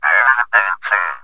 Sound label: probe talk; MOTS